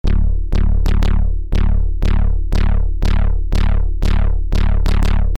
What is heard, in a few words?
Bass; Valorant OST; Valorant Theme